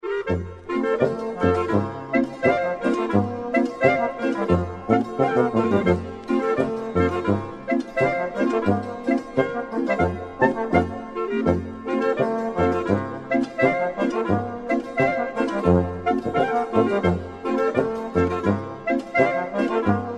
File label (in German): boarischer